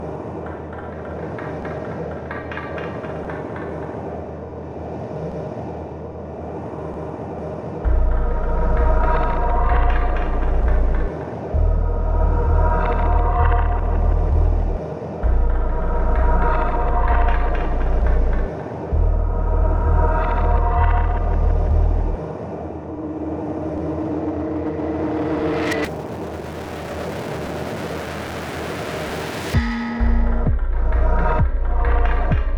Song Ice World; Bit Dungeon III

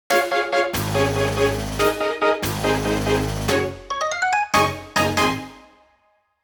First Ship Part Collected!; Pikmin 4

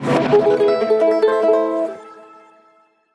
Mariachi Guitar Solo; gamerip; WrestleQuest

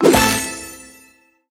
Daily Gift Unwrap

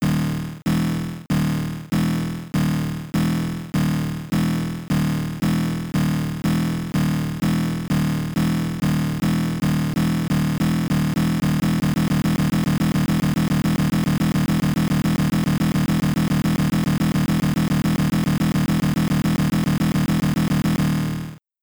Action; m.u.d.s; amiga

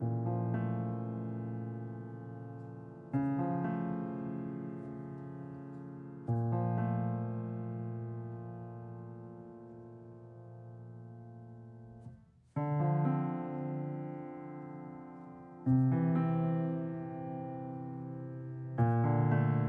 Music Level Erosion Layer

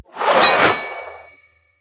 Special Final Sword; LooterKings

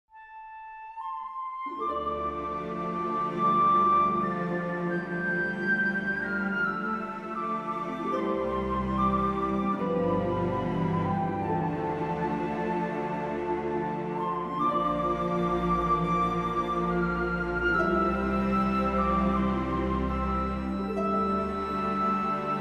Birth Solo Flute; Crusader Kings III

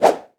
Miss; Inotia 3

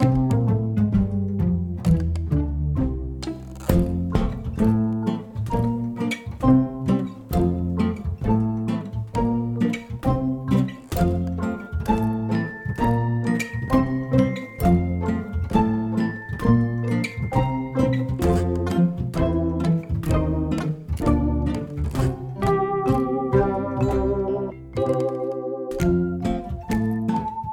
Meadow Loopable; Unspottable; Xbox Series X|S

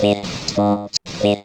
Beat

